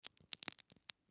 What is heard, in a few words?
Glow Stick Bend, Glow Stick Bend Slow Snap; Foley; Blastwave